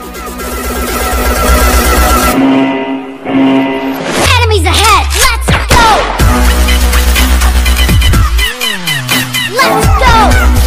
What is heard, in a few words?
gaming remix ringtone